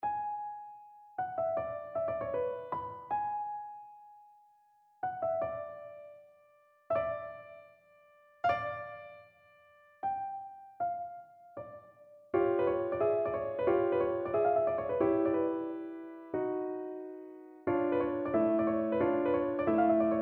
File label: piano